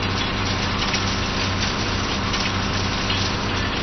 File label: WINCH; winch motor